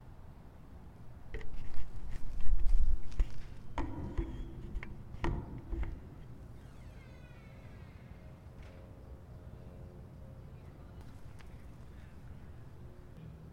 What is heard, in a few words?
rosegarden recording; scripps